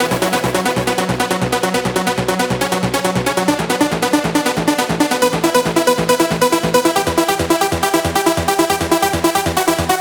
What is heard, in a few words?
Sequence Line 138 BPM, Melody & Synth; Bminor